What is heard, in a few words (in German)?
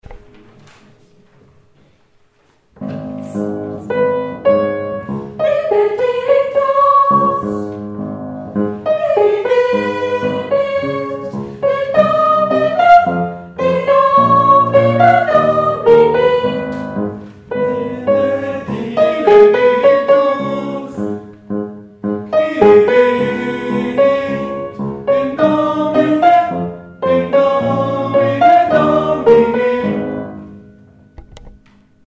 S und T; ab T. 12; Messe; Chor